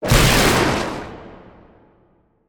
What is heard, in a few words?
Lightning storm; Yu-Gi-Oh! Master Duel